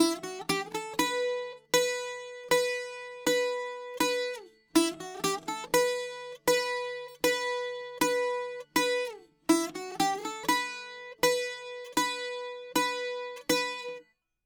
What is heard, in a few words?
bouzouki